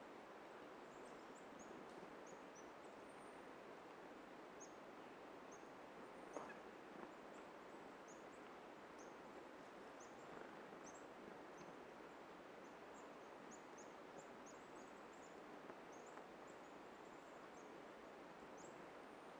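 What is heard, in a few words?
corporate interior half open; Titanfall 2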